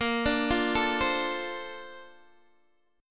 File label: A sharp Major 9th, Guitar, DADGAD